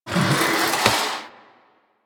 Classroom Chair Drag; Ambience; Warframe; The New War; Quests; Zariman

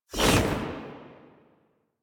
Blade And Whip Pull Back; Melee; Dagath

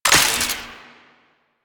Belt Fed Rifle Mech Stop; Weapons; Warframe; Tenno; Frumentarius

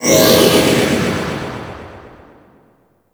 Hyena Gun Aura, Enemies; Warframe

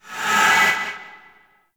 Void Charge, Weapons; Duviri; Incarnon Adapters

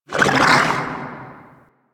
Landscape Cave Creature Calls, Ambience; Warframe; Venus Open World; One Offs

